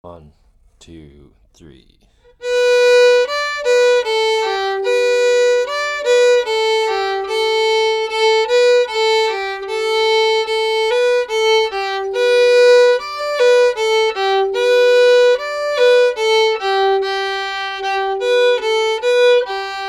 whistle march fast

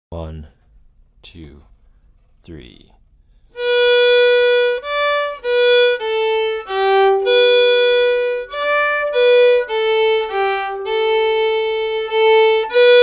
whistle march slow

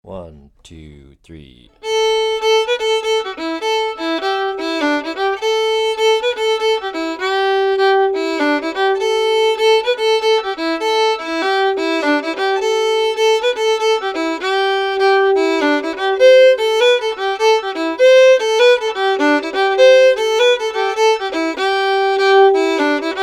elizabeth kellys slip jig fast

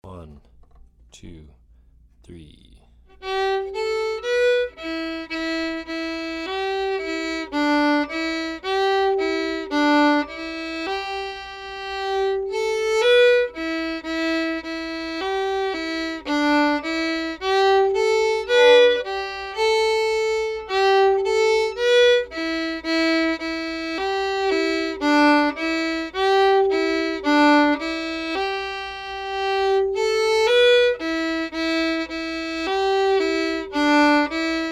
reel slow; gougain reel

